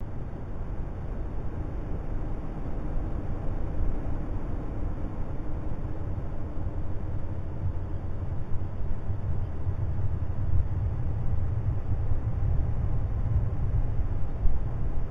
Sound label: Signal